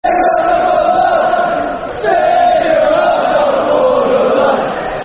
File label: Sing Yer Hearts Out, Football Chant; Grimsby Town Gtfc